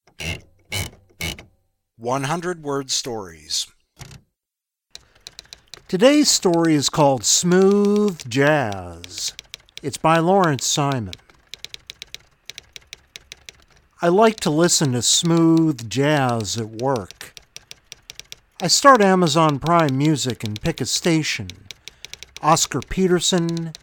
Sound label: smooth jazz